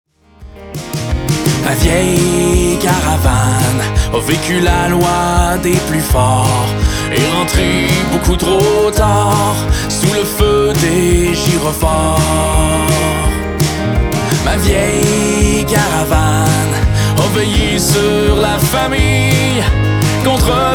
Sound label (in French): acoustique